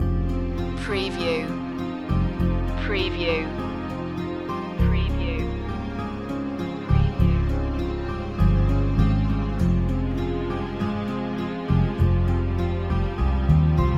lost city loop

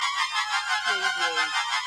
Space Scifi Sound - alarming

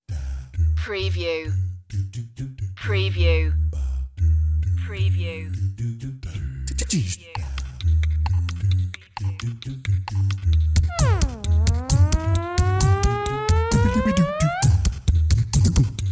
vocal jazz symphony

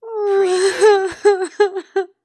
Female Sob

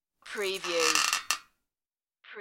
metallic bed squeak

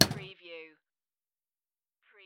Pinball sound, chunky clank

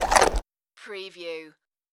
dog creature chew

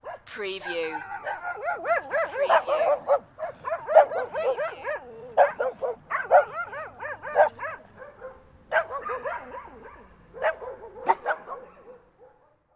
watchdogs approaching

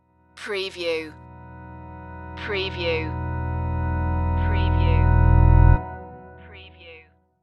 rising light stinger

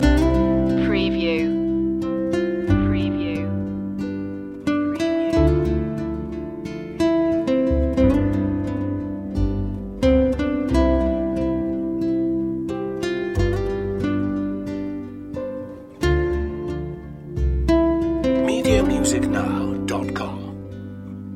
sensitive calm loop

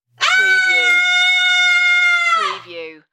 Female Yell Ahh

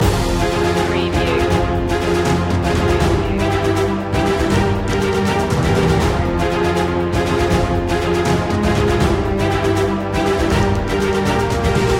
land loop

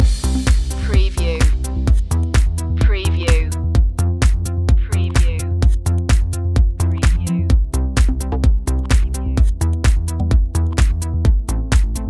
follow the beat loop, chill

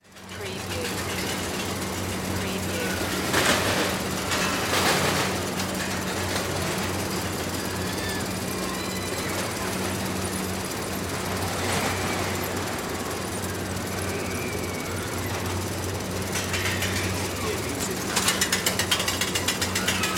Carnival Roll Coaster; sound effects bible

